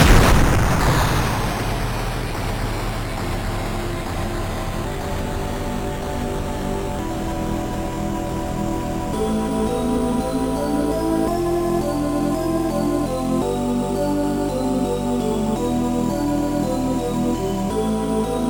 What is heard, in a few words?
game over tune; MED; Megaball